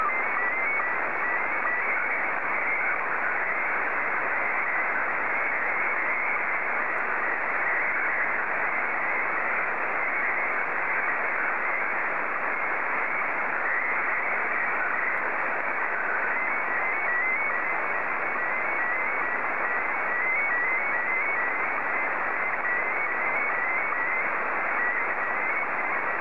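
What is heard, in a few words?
Scottie 1, slow-scan television; OJ4QVJ; SSTV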